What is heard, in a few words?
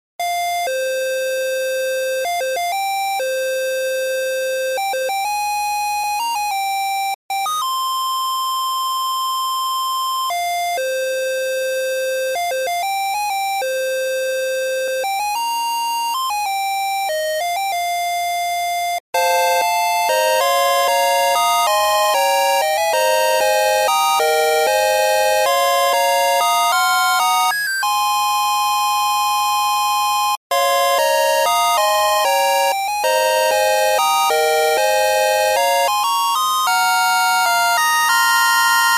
8bit